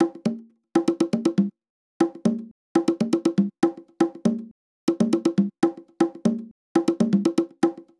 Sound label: 120 hard congas, Nu Jazz House; 120 Beats; PowerFX